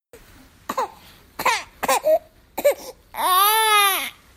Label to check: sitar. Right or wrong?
Wrong